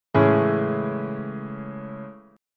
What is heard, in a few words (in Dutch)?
Amaj7 akkoord